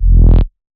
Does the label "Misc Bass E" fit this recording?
Yes